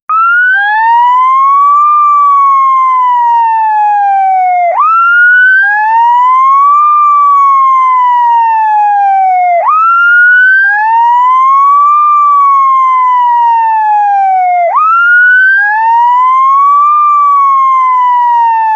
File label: siren from a police car